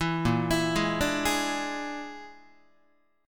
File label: Bb 7th Flat 5th, down, Guitar; 7,6,7,6,6,7; 4A,4E,5A,5D,5Gsharp,6Csharp; Bb7b5 arpeggiated; JGuitar